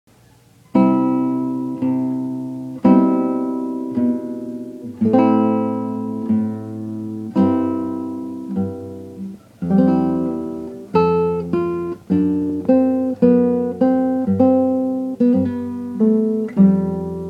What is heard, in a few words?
Air On the G String, guitar